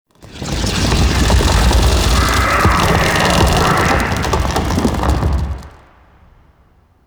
Tree Creak Speak; Midrath Island; Wevetwood

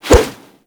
Brawl Whoosh A; Warframe; Brawling

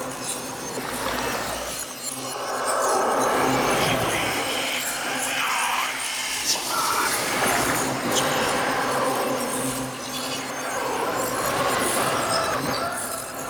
Murmur Portal Shattered Idle Loop; Warframe; Ambience; Duviri

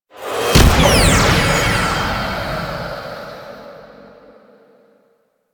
Resurrect; Warframe; Warframes; Trinity

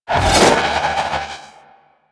Trooper Melee Spin; Warframe; DE; Sentients

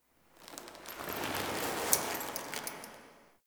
Romance Cin Foley C; Lyon; Warframe; Tau Romance Cinematics

